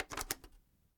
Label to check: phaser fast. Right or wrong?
Wrong